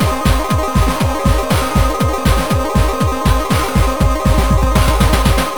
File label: crescendo; sona; pax sona